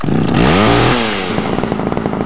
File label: chainsaw knife deploy; cstrike; weapons; knifefun